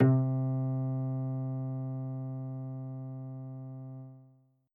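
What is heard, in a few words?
ACOUSTIC BASS; BASSGTR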